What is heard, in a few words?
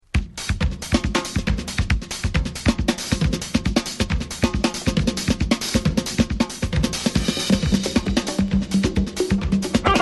Samba